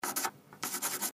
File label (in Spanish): lapiz